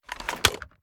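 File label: sniper inspect up; gmod; iw8; xmike109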